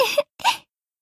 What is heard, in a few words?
match start; kik0